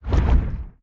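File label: movement heavy push; smite; god atlas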